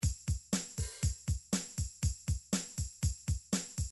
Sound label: midi drums drums